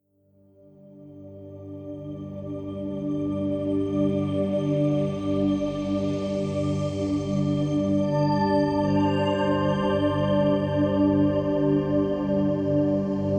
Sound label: Instrumental